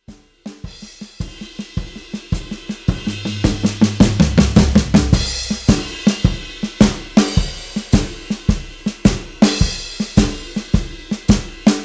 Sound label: blues midi